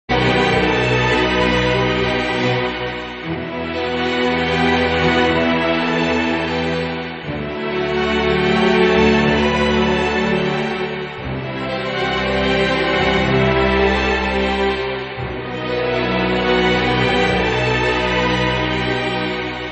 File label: Strings Theory Medley; Giana Sisters